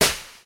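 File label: sounds of coffee beans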